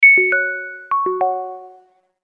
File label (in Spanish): Ding Dong, tono de mensaje; Notificaciones